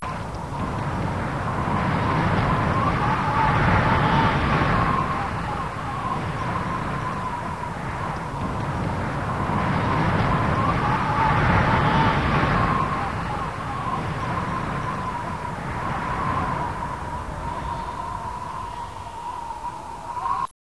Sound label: snow